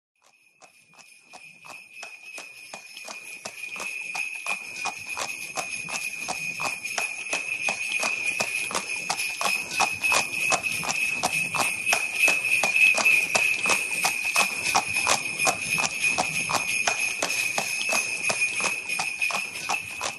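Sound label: sleigh with bells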